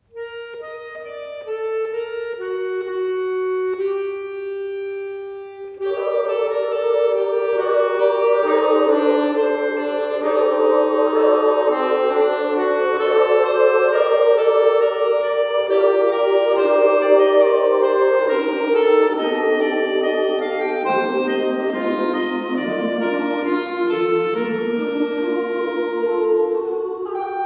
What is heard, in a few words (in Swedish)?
Två menuetter